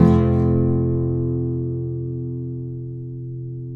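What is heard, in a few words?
nylon guitar down major F#, flam chords; Spectrasonics; Hans Zimmer Guitars VOL-2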